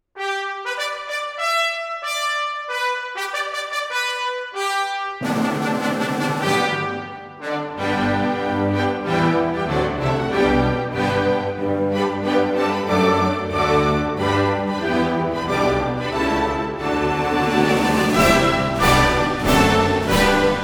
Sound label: National Anthem Hong Kong China